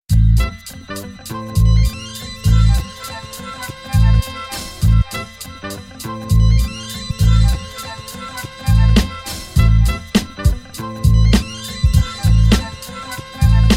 beat

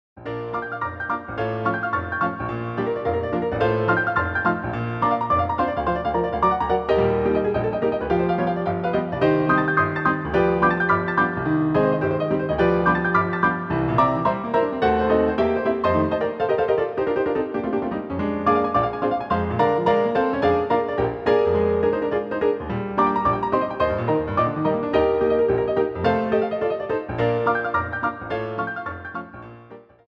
piano Roll

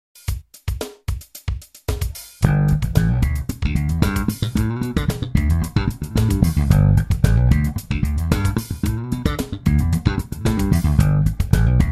Slap with doublethumbing in G major

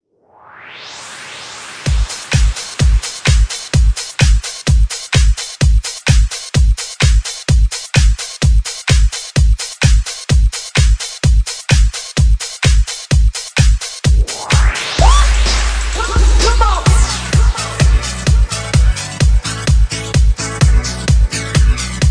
vocal mainstream 128bpm

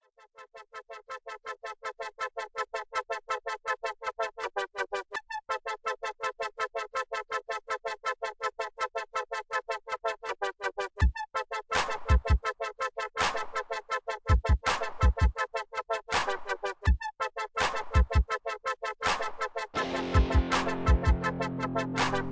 Jazz Funk, Ambiance

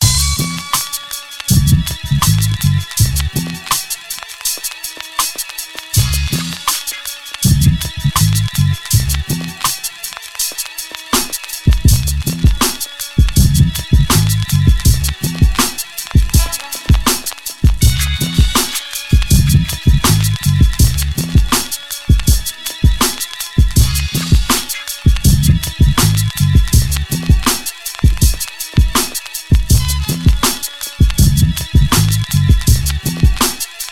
beat

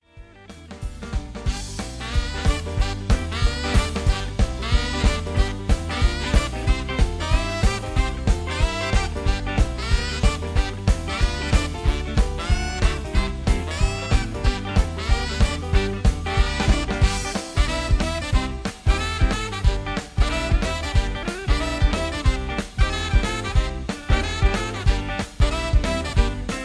Karaoke, Key G; Karaoke Mp3 Track

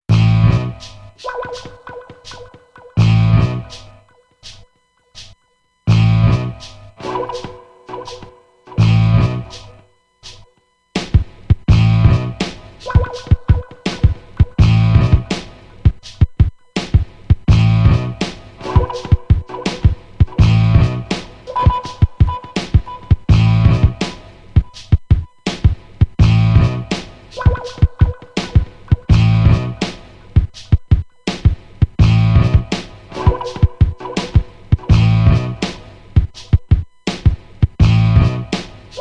beat

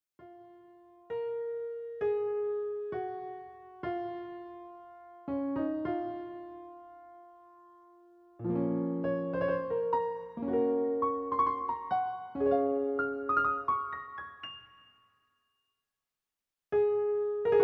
piano transcription; L Alouette The Lark; Glinka; Balakire

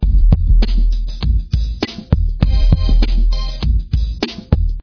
Heat Beats Chorus 100bpm

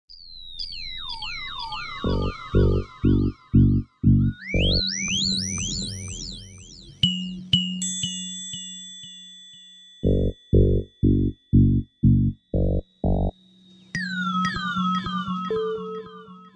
Seti Phone Ringtone

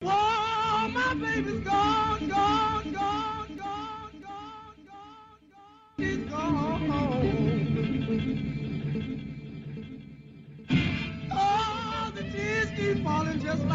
beat

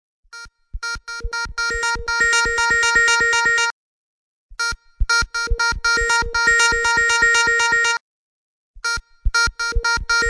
beep beep; Ringtone Records

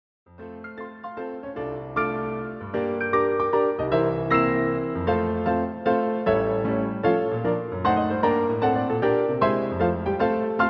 piano roll

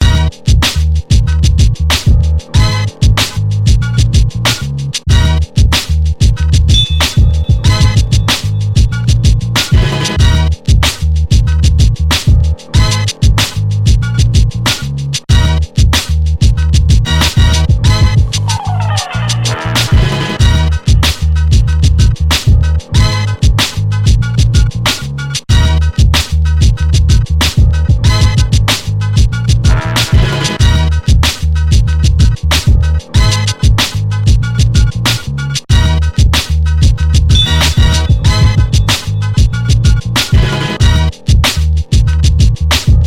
beat

